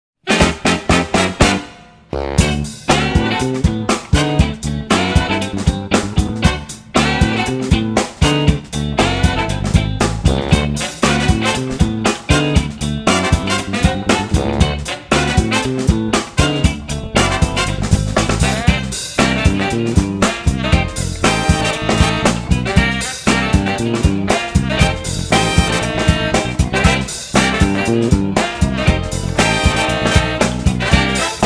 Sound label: Funk Machine